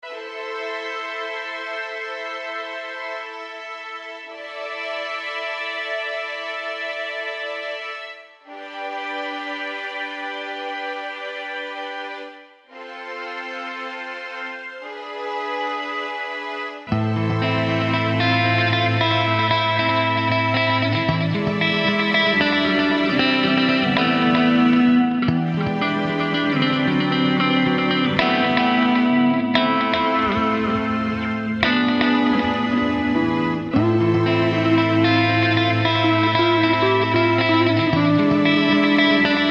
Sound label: MIDI guitar; James Tyler Variax + Micro MIDI GK3, Axon 100 et Emu Proteus 2000 (Orchestra Vol. 1 ROM); Violons; POD HD PRO